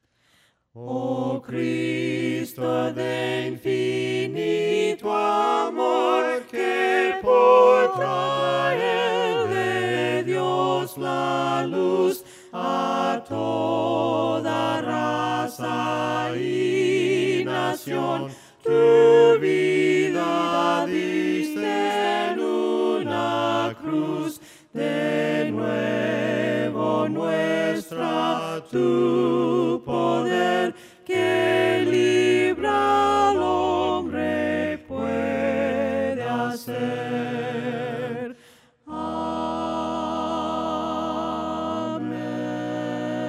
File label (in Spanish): ¡OH CRISTO DE INFINITO AMOR VOCES